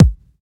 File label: silky bass drum single shot b key, steel kick drum sample tuned to the B note; kick drum samples